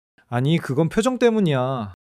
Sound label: laughter